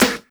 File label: clicky snare drum g sharp key